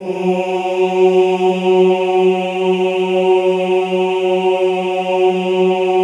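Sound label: OOH F#2 right, classical choir; Best Service; Extended Classical Choir; OOH MIX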